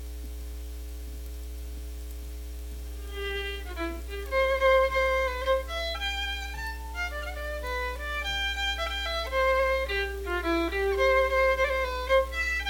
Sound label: Polska